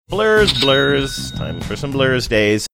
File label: singing blurs blurs time for blursdays